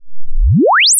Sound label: log sweep 1Hz 10000Hz